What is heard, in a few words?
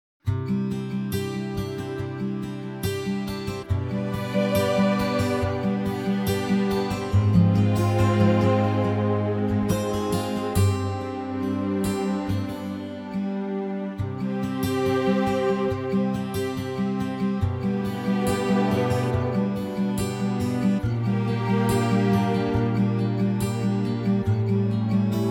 C orch; key - C - vocal range - G to D